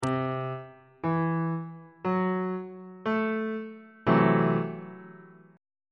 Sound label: g double sharp minor 6th 1st inversion on bass clef